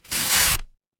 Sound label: paper fabric tear